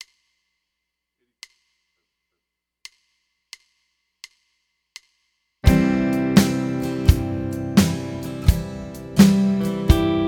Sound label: C Major Scale Song Backing 85BPM; 7-Day-Bass-Challenge